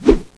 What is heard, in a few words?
knife slash, swords; minecraft